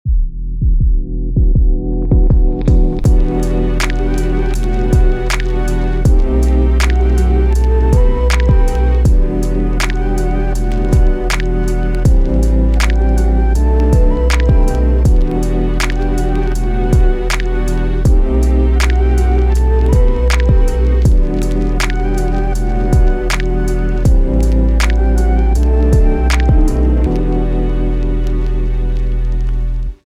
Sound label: lo-fi midnight hip hop